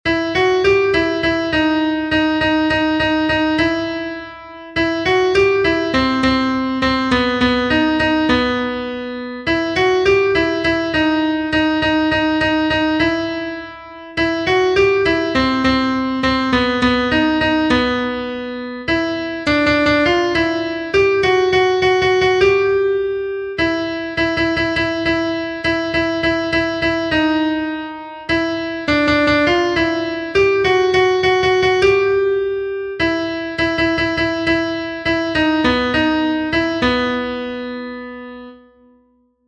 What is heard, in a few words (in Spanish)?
CONTRALTO, himnos en piano; 36 ALABANZA; cantos del camino